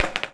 skater board up; skate shop; Tony Hawk's Project 8; XBOX

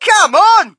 crowd, shout; Mike Tyson Heavyweight Boxing

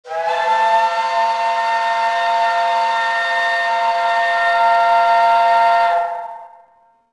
Ship Horn; Project Gotham Racing 2

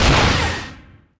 bazooka; weapons

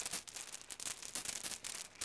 towing loop; Cars; XBOX

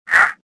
choke; Star Wars Jedi Knight II Jedi Outcast; XBOX

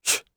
attack voice; UFC Tapout

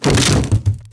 deadfall metal, foley

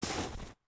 FS Snow, footsteps

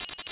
binoc confirm Beep; interface

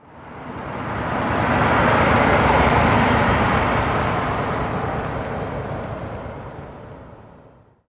car; Virtual Pool Tournament Edition

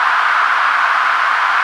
Skid Ice; Totaled; XBOX; skids

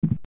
step, footsteps

